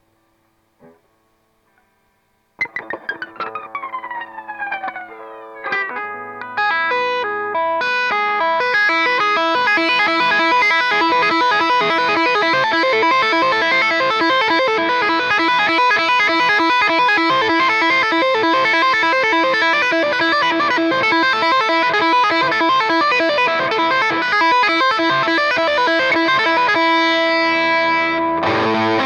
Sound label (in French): lead G1 PP micro chevalet AMZ mosfet booster